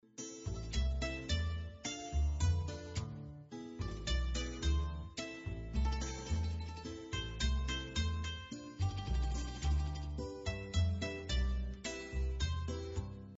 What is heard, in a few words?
menu bgm